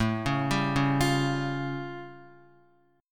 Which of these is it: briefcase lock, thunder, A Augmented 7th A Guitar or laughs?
A Augmented 7th A Guitar